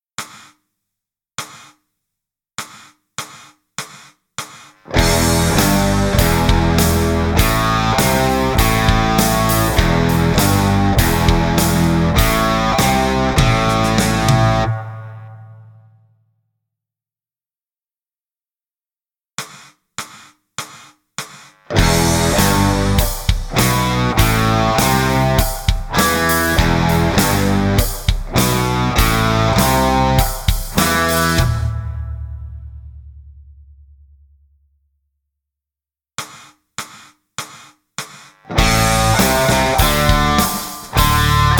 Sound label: Riff